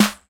sharp snare single hit G sharp key, acoustic snare sound tuned to the G# note; snare drum samples